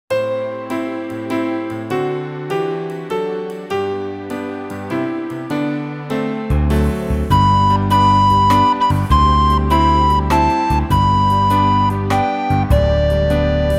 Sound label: Waltz; red hot recorder tutor